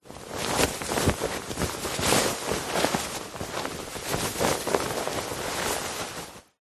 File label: clothing shirt movement rustle; zapsplat